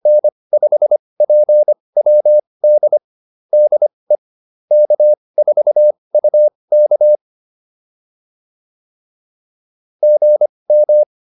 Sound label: QSO, intermediate practice files